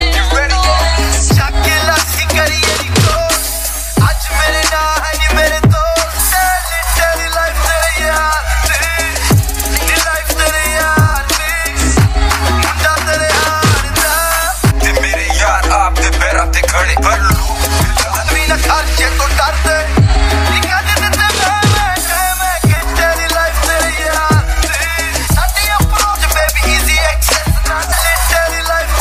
Remix Ringtone

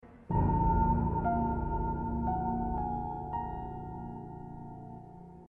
Season 8 Theme Music Ringtone; Game of Thrones

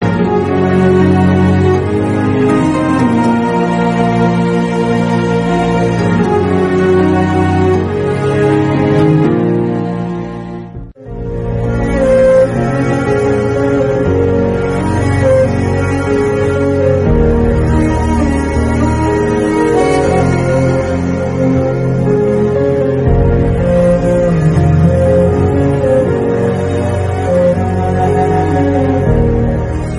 Mother Love Telugu Ringtones; KGF 2

